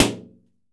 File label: shower, impulse responses; howard fredrickson